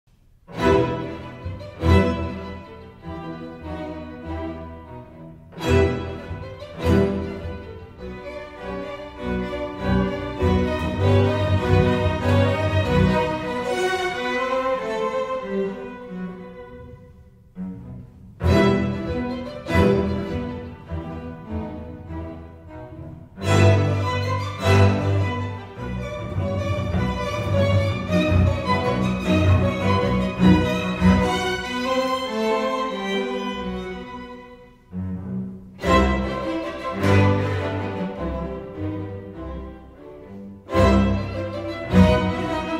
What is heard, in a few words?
String Quintet No 2 Op 77; frisson; Dvorak